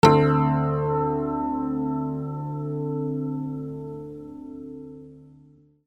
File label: MUSICAL